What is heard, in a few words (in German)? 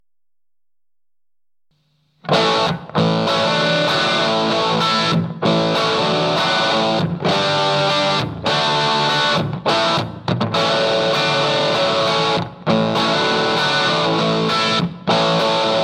E Gitarre Kofferamp